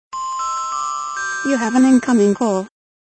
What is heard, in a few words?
Incoming Call